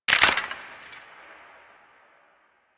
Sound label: Bone Break; Golden Maw; Warframe; Ambience; The War Within